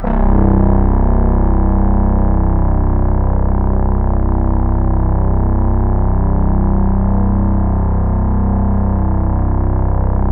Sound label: C1, Synth Line; 90 s Sample CDs; NiceBeats; SynthLine VOL-27